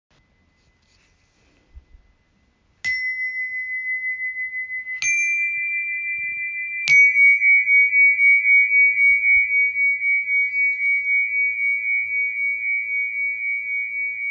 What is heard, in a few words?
chime recording